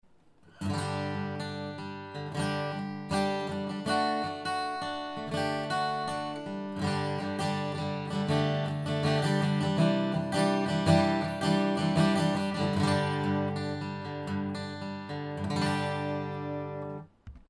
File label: martin sound